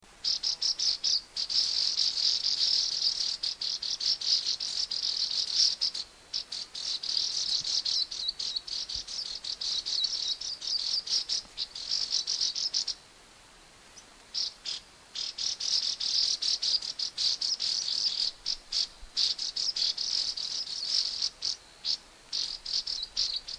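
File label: parrotbill voice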